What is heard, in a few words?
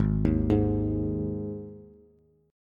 Ab 5th Ab Bass; arpeggiated; JGuitar